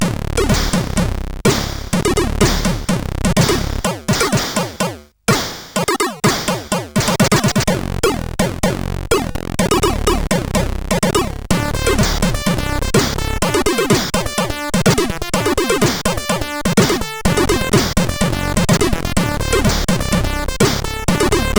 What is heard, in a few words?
sid CSG8580R5